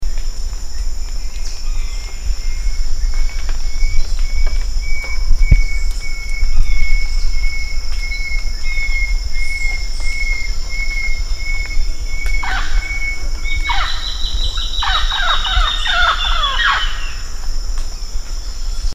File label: Rondônia bushbird; پرنده بیشه مرغ راندونیا